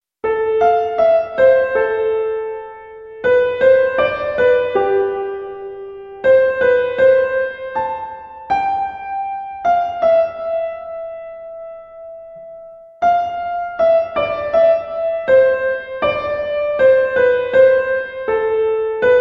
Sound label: Melody; ORCHESTRATION CHALLENGE XVIII